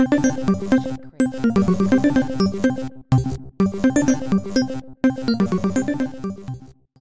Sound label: gameish; soundscrate